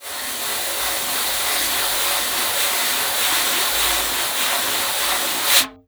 STREICH1; Best Service ProSamples vol.42 - Session Instruments

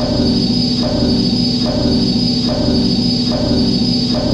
MASCHINE; Best Service; Advanced Mega Effects

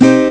guitar moll; ST-XX Amiga Soundtracker Sample Packs

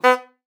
HUPE; Xsample VOL-9

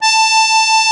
AKK VIOLI, akkordeon; Best Service; Alpine Volksmusik

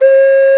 OKARINA; FLOETE; Best Service; Alpine Volksmusik

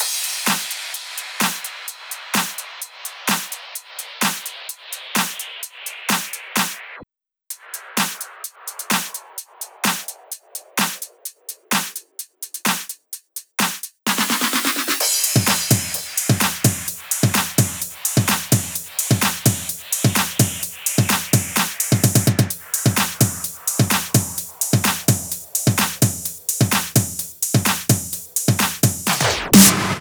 Drum Loop 128BPM FULL ohne BD

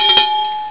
train glocke; ST-XX Amiga Soundtracker Sample Packs

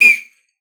TRILLER; Xsample VOL-9

